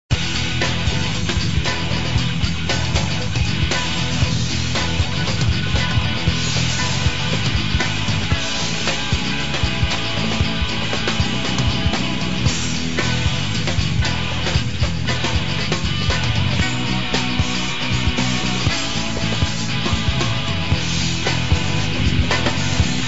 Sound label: lofi